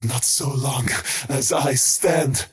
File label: skywrath crown deny pain; skywrath mage